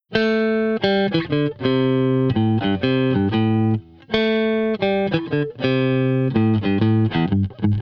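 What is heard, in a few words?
Blues Guitar Intro; Digidesign; Pro Tools Essential Loops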